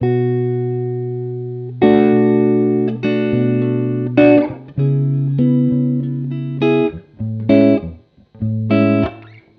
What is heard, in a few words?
Xmass Guitar Loops 100bpm Amaj; Guitars; Ghosthack; Advent Calendar 2019